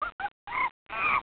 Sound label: Monkey, Amazon Sound; Amazon Trail